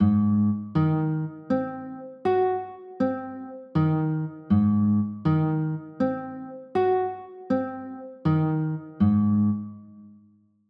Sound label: a flat 6